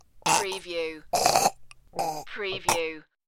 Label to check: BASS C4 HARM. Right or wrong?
Wrong